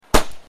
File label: fireworks pang; ambient; pvkii